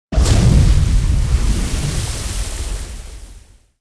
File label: tanks explosion water; War Thunder